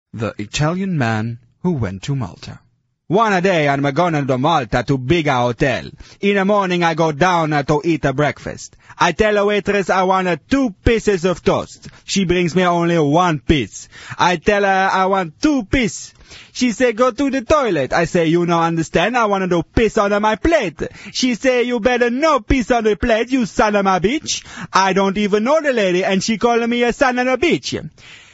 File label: Italian music tone ringtone